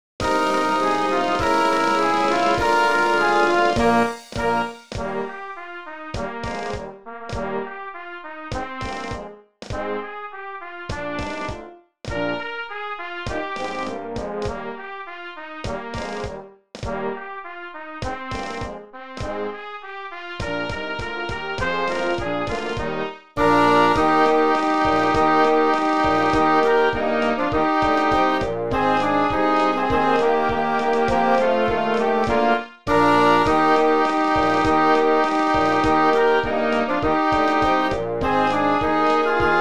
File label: jingle bells advanced playback